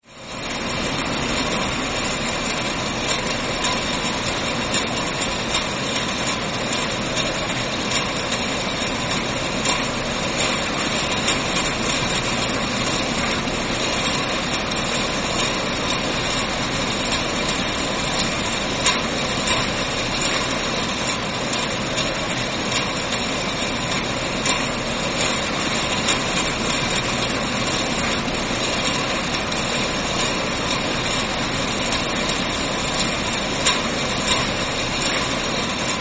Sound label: brewery plant canning line conveyor belt